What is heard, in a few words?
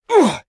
Male Fight Damage; Mighty Party; Android